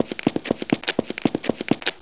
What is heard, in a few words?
steps; alyx